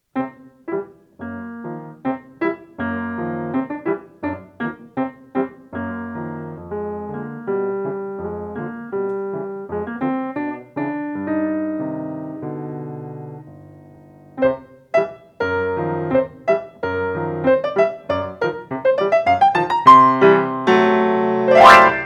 piano solo

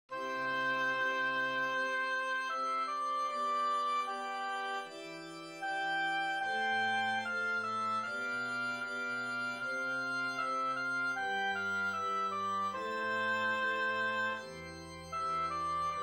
adagio transcribed for organ solo

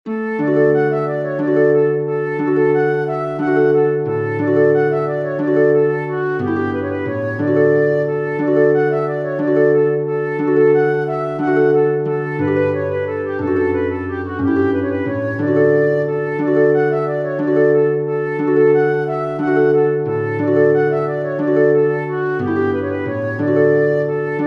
for flute oboe harp; turlough carolan; leixlip the proud

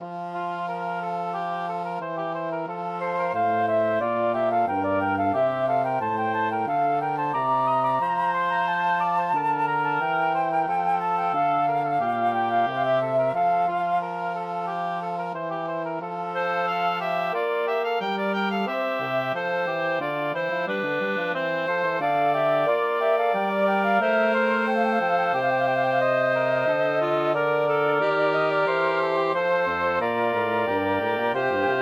dialogue sur les grands jeux for woodwind quartet; François Couperin

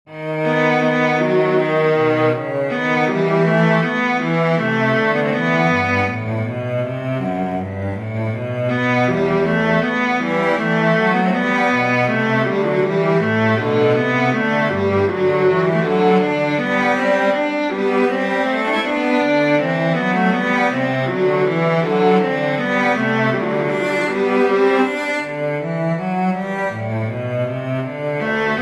recitative arioso for viola cello; Johann Sebastian Bach; spricht der herr

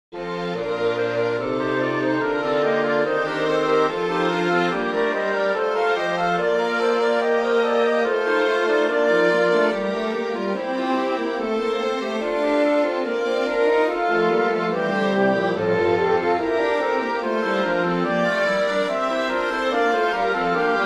for winds strings; haendel georg friedrich; sacerdos from dixit dominus

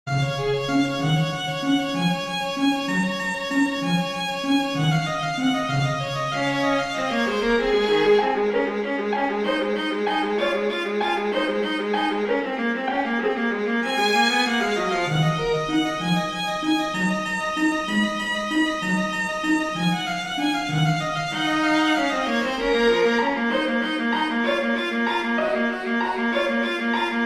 prelude major for violin viola; bach johann sebastian

